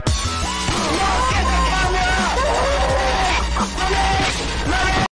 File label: powerful music